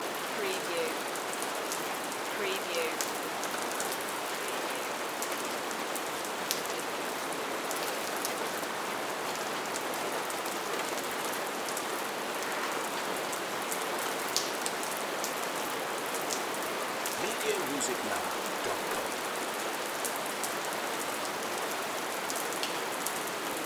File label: Rain Light Steady